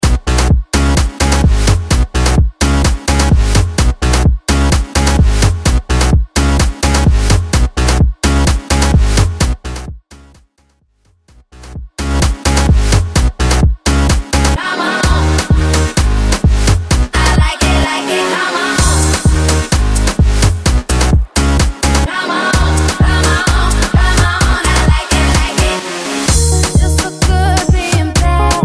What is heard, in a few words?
Tribal Bootleg 128 Bpm